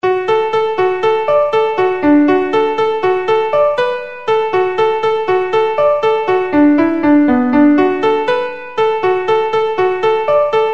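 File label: Slip Jig, Traditional Irish Music; Fishermans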